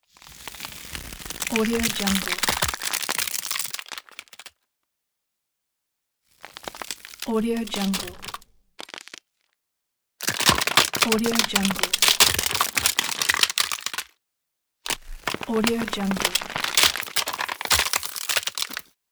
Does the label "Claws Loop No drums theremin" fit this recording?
No